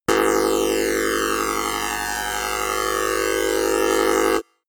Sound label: sci fi alarm red flash long